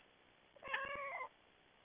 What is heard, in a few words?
cat meow